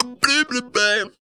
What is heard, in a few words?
Funky Vocals; USB Soundscan vol.18; AKAI